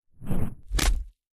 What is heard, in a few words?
flesh chop